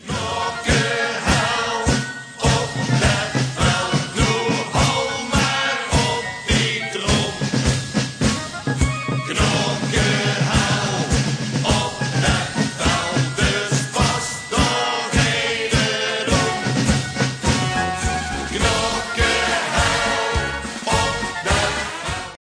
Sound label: ringtone